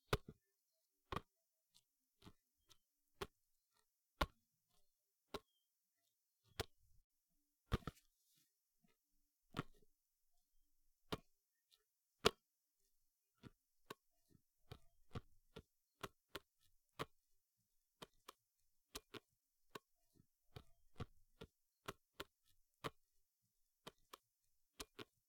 Throwing A Ball

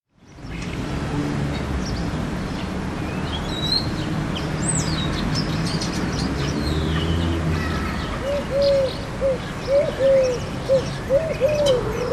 Birds Vs Police Siren

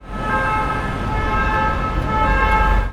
Ambulance

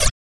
Laser Shot Short

